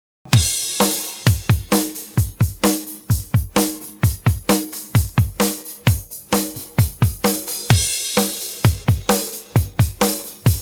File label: pattern, drums